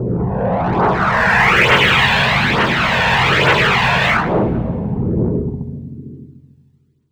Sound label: EFX Rixxer Noise; Polyend; Plughugger